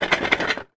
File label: game scrabble game box movement